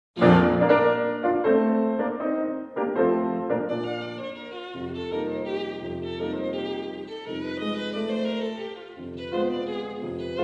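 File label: piano trio; novak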